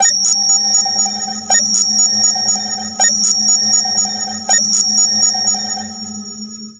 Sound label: Computer Danger Warni, stinger